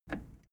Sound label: plastic button scratch push disable